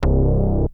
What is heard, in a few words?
Synth hits and riffs